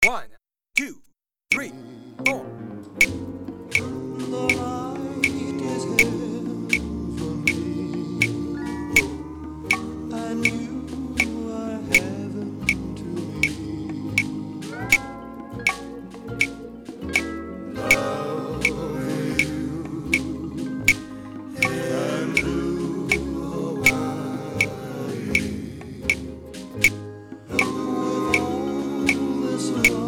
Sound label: Band Reh